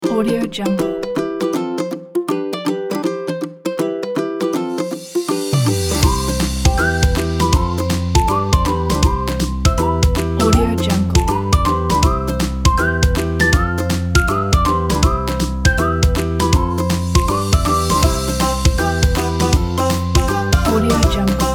short happy tune

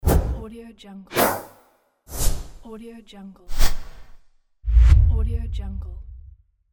fast woosh

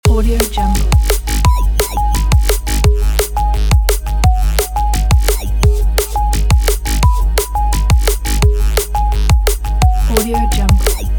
drum bass loop

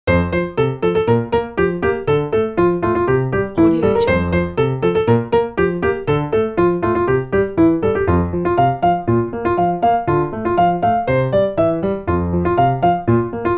happy piano tune

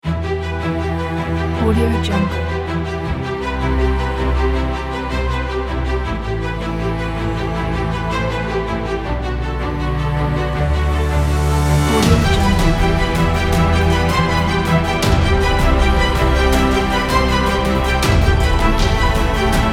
dramatic epic cinematic trailer

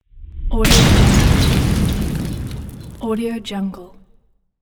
explosion and fire

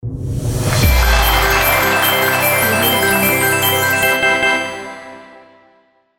jackpot

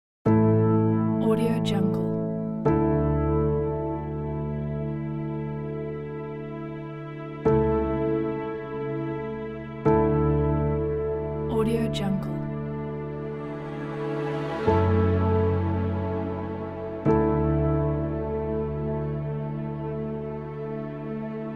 sentimental cinematic piano

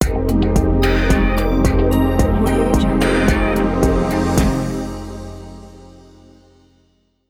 musical orchestra logo opener

